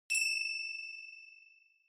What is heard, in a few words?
Finger Cymbals Dry Short